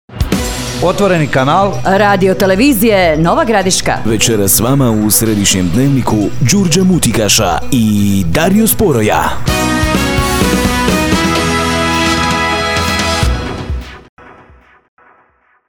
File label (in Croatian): JINGLE